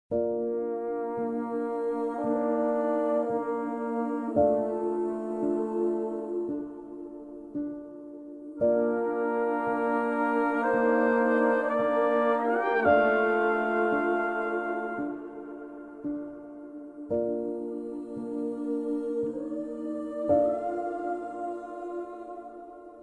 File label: Intimate Studio Brass; 8Dio; 录音室管弦乐器系列